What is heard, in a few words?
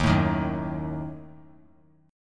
Eb Diminished; Ebdim strummed; JGuitar